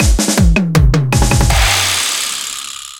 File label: Level End; Blastmorph Pinball; xbox series xs